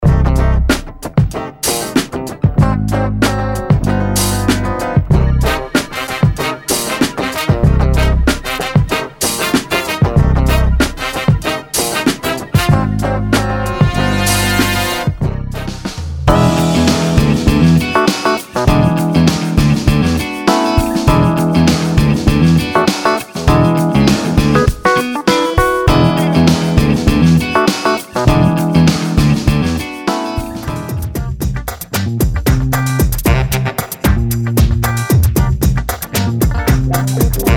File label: Funk mix